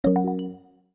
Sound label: new message